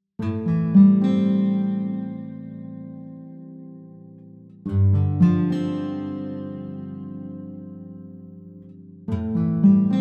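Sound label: bugcity lowkey head 108bpm A minor 26 beats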